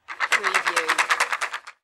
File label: Billiard, Pool, Snooker - Rack up balls in frame